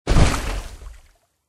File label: water walk, power armor; clothing system